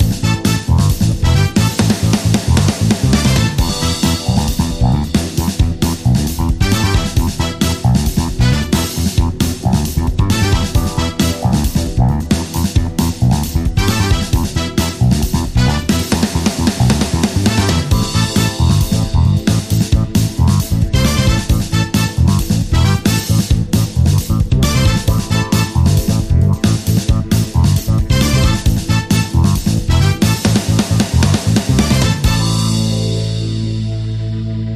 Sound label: Funky Soul Cats